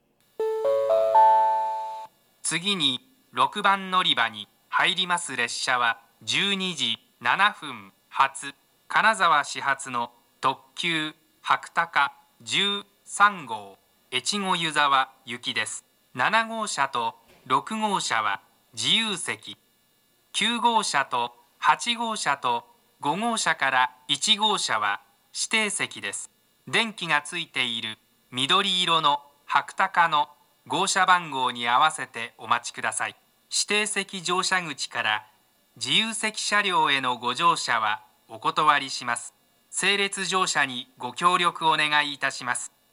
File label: kanazawa limited express; echigo-yuzawa; jr-west